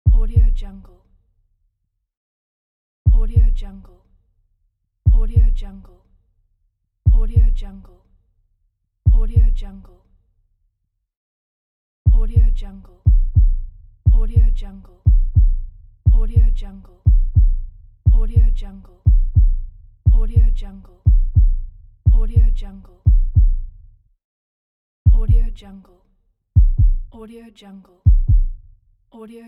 heartbeat pack

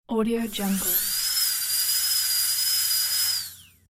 dental drill

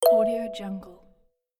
button menu

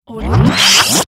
radio transition effect

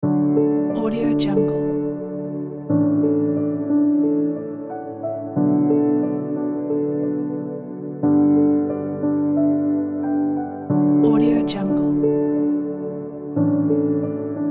ambient piano kit; abellsound

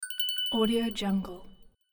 little bell ding

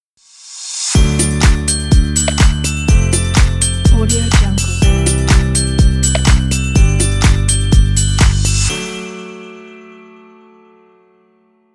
happy funny logo

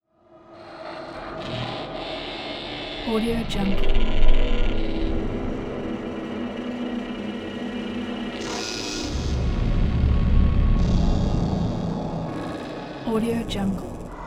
bonus weird kyma soundscapes; robotic lifeforms 2

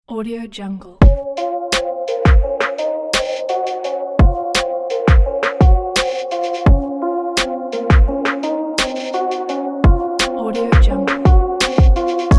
dark ambient hip hop; apelayomusic

